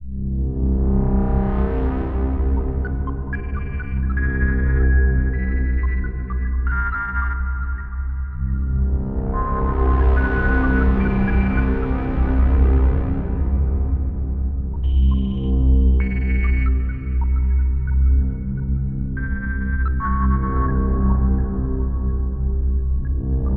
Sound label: Item Room, Item Acquisition Fanfare; 101 Run